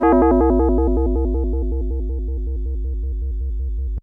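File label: FM Bell FX DX100 A#3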